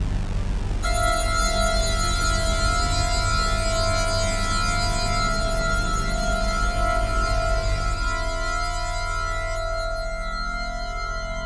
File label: stinger